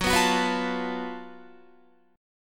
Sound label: F sharp Minor Major 7th Double Flat 5th, Guitar; F#mM7bb5 strummed; 14,14,16,16,17,16; 4E,4A,5D,5G,5C,6F; chord shape